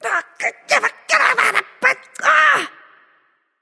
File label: grumble; smite